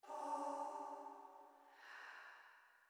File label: Chime; chime sharp